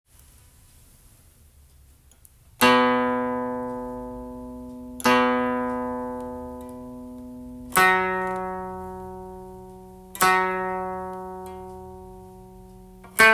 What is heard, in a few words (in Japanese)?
壱越 D, 箏 調弦; B F# A B C E F# A B C E F# B; 楽譜では壱越ですが、高すぎてお唄いにくいときに一音下げる神仙バージョンもご用意しました。